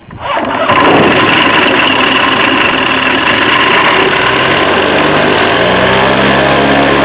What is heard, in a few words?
rotax 912 start; skyarrow